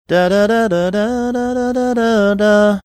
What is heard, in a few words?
singing melody of song while trying to think